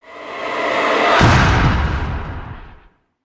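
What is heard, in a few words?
Hit Swoosh Ascend; Brand X Music; Brand X Music Catalogue - Editor's Essentials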